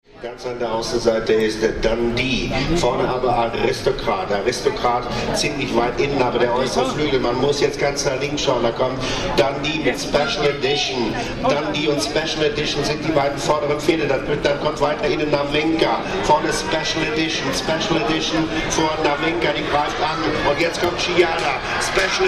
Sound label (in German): Pferderennen